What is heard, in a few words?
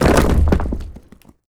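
ceiling down full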